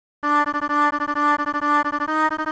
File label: 3x Osc